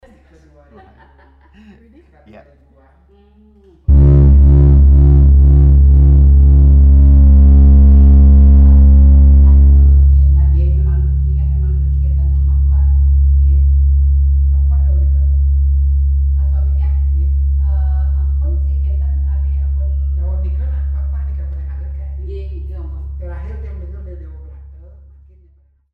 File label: Gamelan Factory